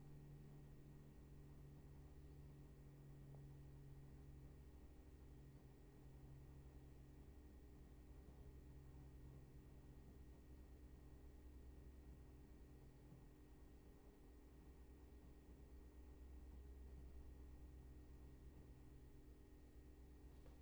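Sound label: roomtone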